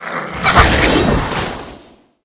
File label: two headed hound attack, monster